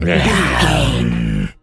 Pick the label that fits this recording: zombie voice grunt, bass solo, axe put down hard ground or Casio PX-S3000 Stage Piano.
zombie voice grunt